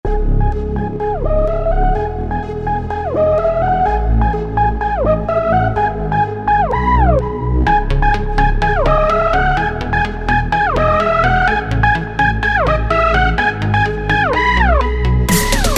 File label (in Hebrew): פרוגרסיב האוס